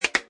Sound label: Cartoon Clicks, Comedy/Cartoon CLICKS PITCH UP: Ratchet up clicks, swipe.; Funny Sounds; Lynne Music